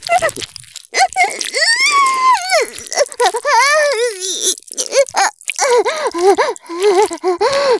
絶頂 おしっこ, ASMR; 騎乗位

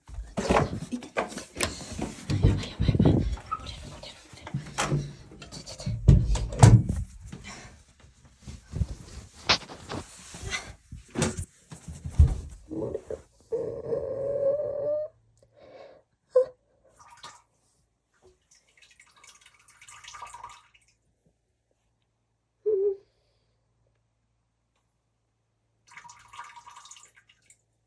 あわてておしっこ

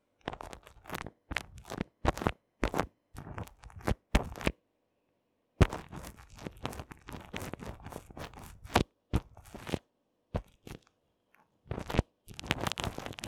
クリームマッサージ, 耳が気持ちいいASMR; 濡れ綿棒耳かき

